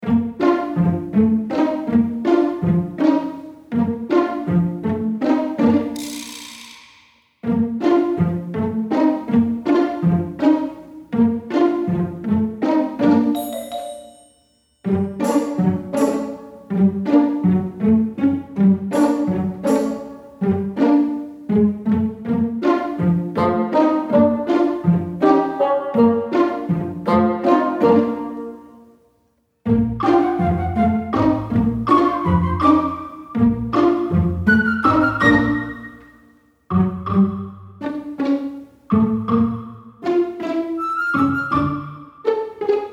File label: Higgledy-Piggledy; Noisebiscuits